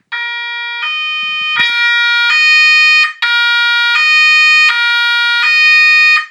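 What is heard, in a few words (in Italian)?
CITYPOL POLIZIA, Sirena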